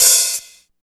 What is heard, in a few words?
OP HAT; East West - Phat & Phunky